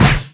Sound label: PUNCH; ROCKEM; DIRECTX